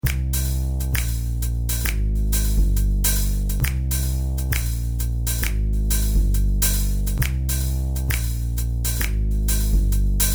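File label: Musical looping finger click rhythm with hi hat; Musical looping flinger click rhythm with hi hat and walking double bass riff.; Musical Sound Design; Finger Click Swing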